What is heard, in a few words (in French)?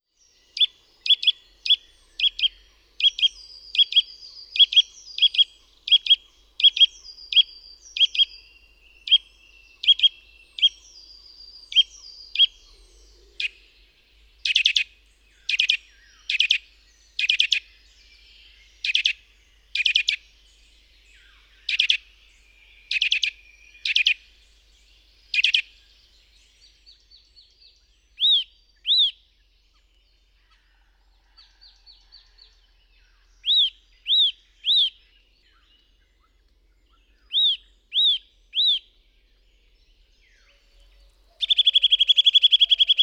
sitelle torchepot; oiseaux de nos forets